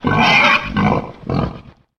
Grunt; Eldin; Lord Bullbo

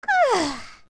Young Yawn

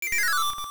Beehive Fall; The beehive falling

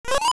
Emote Question; Character emoting a question mark

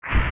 Enemy Poof

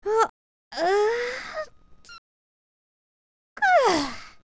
young yawn full

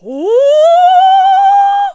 Ladys Ensemble; Madame Couture creating the Lady's Ensemble (2 parts)

